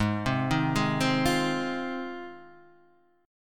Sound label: Ab 6th Ab Guitar Standard, Ab6 chord; arpeggiated; JGuitar